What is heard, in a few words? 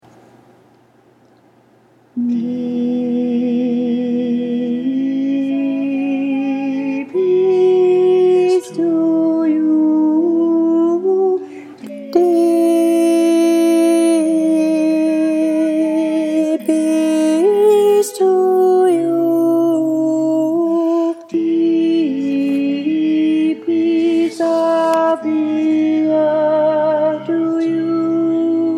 Alto